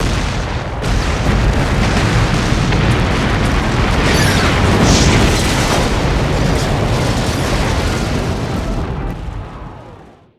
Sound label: Explo Large Multiple; Destruction